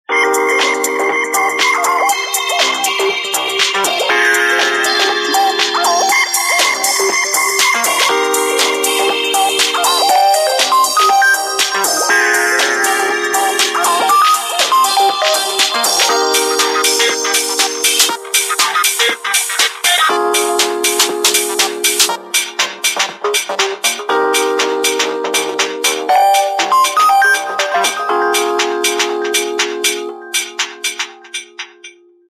Ringtone, Samsung GT-S3310

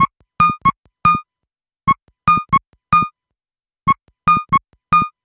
tennis, ringtones

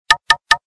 Knock; KF510

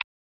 Keypress Delete

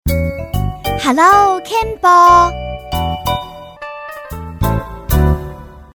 Ring; Kenbo; E42

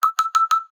pulse, notifications; ZTE nubia Z20

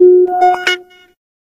wahwah, notifications; Sony; Xperia Z3

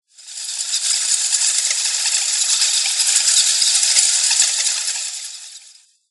Ring Bats; Microsoft; Windows Mobile ringtones; Ring Tone Pack 6

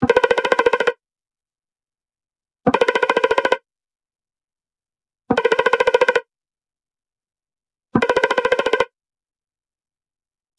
radiate, ringtones; Nothing Phone (2)

